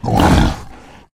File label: boar aggressive; monsters